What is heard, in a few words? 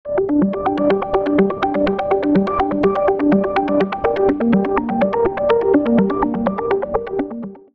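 Synth Arps G minor; Tagavaka x Basic Wavez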